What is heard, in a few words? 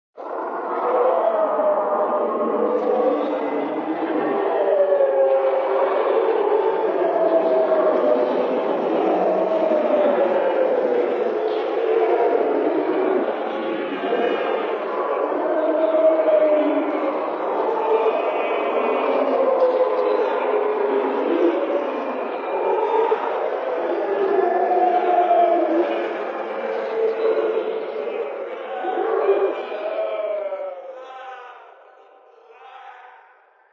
Voices Hell Deep, Multiple horrible screams of souls trapped in hell; Devil Voice Sound Effects; Sound Effects:Horror